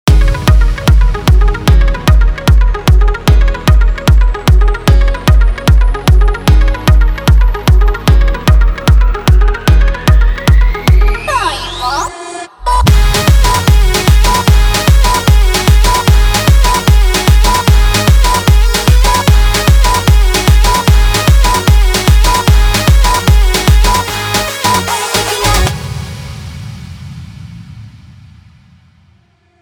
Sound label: edm mix remix, Odia Dj Song